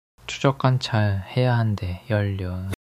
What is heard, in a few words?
struggle